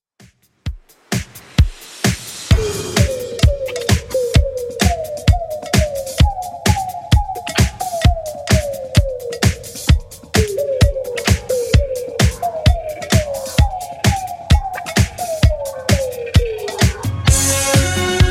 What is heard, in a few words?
Christmas Redrum 130bpm